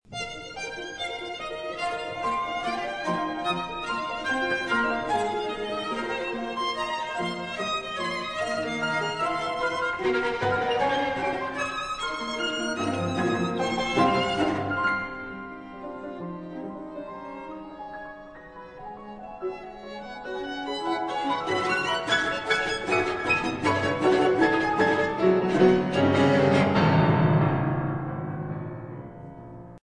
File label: Sheng